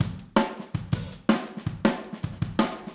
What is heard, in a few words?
Overhead, Drums, 162 BPM; Drums from the Big Room The Mixes; Take Your Toms Off; Verse